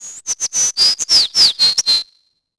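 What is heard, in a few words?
ambience bird; Tony Hawk's Project 8; XBOX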